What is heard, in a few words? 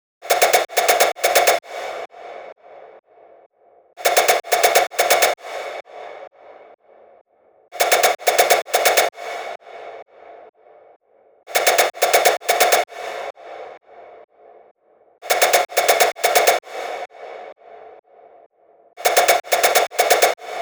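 128BPM Drop Lead wet SC, Synth, F minor; Vengeance Essential Clubsounds Vol.5; VEC5 Demo Constr Kits; Mainstage Kit; Root Fmin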